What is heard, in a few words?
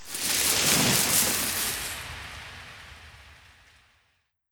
Enchante Leaves